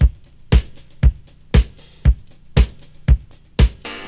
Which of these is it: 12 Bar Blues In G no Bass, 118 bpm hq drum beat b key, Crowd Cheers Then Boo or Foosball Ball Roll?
118 bpm hq drum beat b key